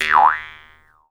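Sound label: Jaw Harps, Pitched Percussion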